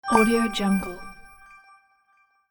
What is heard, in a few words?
miracle pop